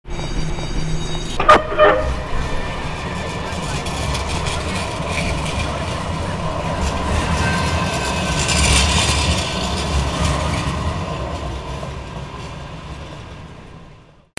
TRAINS

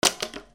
GAMES

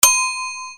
KITCHEN

